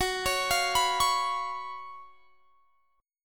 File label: F sharp Major 7th Suspended 4th; F#M7sus4 Chord; arpeggiated; x,21,23,22,24,21